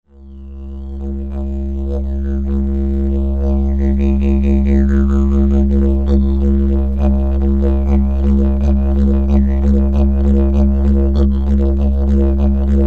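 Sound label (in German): Bambus, Didgeridoo; mein allererstes,ein Geschenk von einem Freund - bemalt mit Dot-Painting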